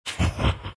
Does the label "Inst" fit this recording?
No